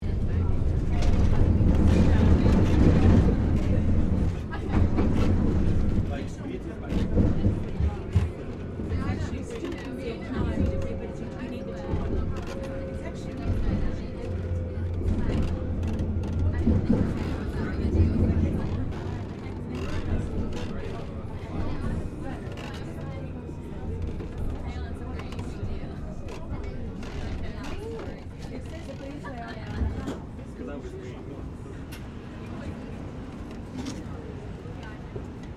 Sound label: Tram Melbourne C Class Interior Crowded; Various Melbourne trams; Streetcars; Vehicles